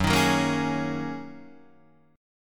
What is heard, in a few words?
F sharp 6th Add 9th Guitar Open D, chord